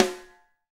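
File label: SD, snare drum; Real-Kit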